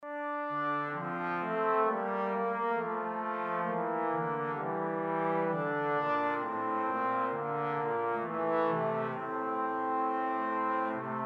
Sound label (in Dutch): Duetten voor trombone; Duet 3